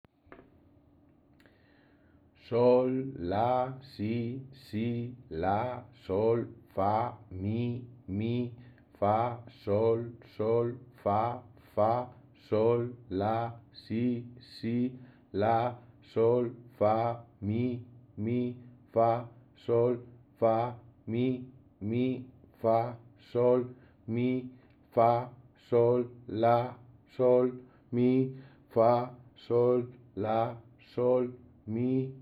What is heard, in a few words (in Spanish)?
cancion mononota